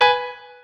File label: piano